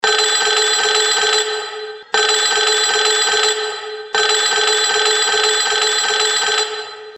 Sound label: bell